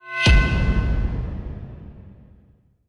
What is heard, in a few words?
Epic Metal Hit; soundscrate